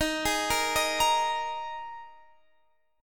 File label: Eb Suspended 4th Eb; Ebsus4; arpeggiated